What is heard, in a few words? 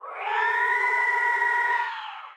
Death; Likho